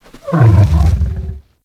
Kubrow Tame Idle Alert Head Down A; Warframe

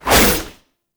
Whoosh; Warframe; Melee; 1999 Skana

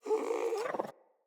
Kubrow Puppy Interact Affection Closed Mouth

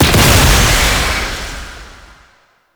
Void Fissure Close; Warframe; Entrati; Conquest

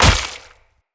Dagger Impact Flesh; Weapon Impacts; Daggers; Dendrit Dagger; Combat

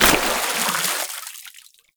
Wall Climb Dodge End Water; Warframe; Oraxia

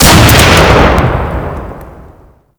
Rocket Launcher Fire; Warframe; Weapons; Vay Hek

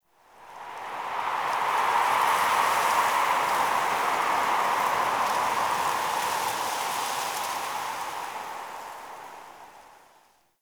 Night Wind Gust Medium; Warframe; Eidolon Plains; Ambience

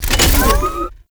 Drone Fabricator Spawn Start; Characters; Warframe; The New War; Veso

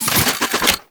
Fist Spin; Enemies; Warframe; Tyl Regor

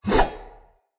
Saw Dagger Whoosh; Weapons; Player; Swords

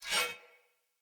Short Sword Whoosh Accent; Weapons; Swords; Sollos

